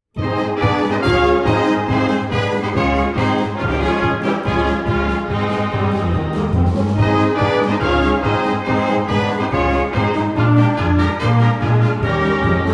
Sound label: here comes thunder instrumental